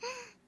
ahh

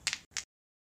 bone cracking sound

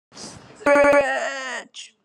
ah stretch

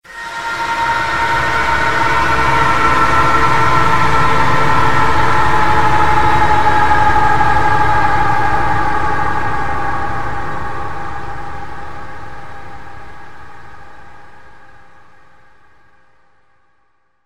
reverb reverse; goku

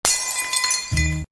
glass drop

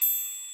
triangle

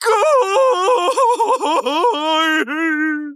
crying

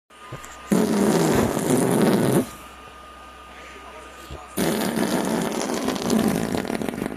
ahh

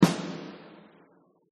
drum

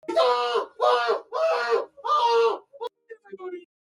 aaaaahhh

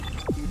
confirm; deadspace

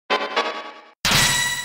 battle start; deltarune

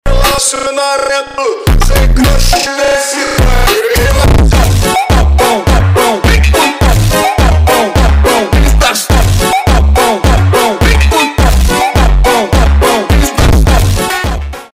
funk

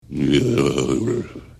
groan; sideshow bob

